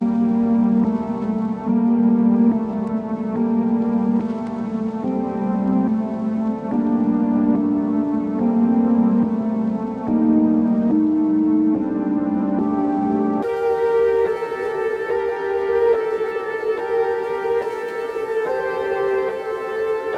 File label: 143 BPM G Min Keys; Cymatics; Rise Up; Destiny Gold Expansion